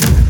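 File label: GATE KEEPER, AMBIENT DRUMS; Spectrasonic Distorted Reality 1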